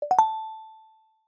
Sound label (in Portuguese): Lembrete; Lembretes Notificacoes Sucesso